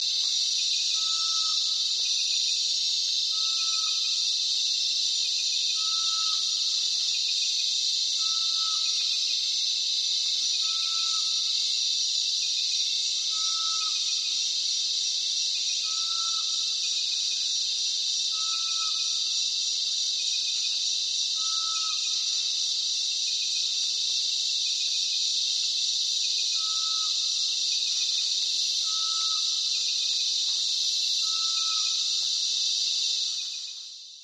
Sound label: Glaucidium tephronotum, Roodborstdwerguil; uilen; Strigidae